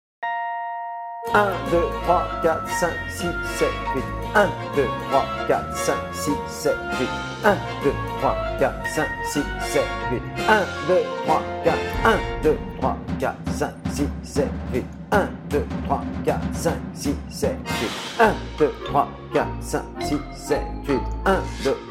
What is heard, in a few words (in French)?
Corde Musique avec comptes